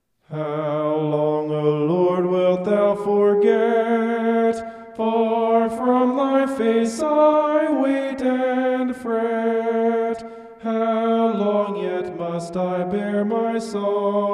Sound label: vocal homoph; psalm 13